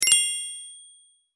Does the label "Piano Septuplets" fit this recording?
No